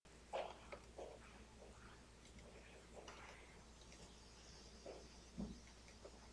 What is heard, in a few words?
spoon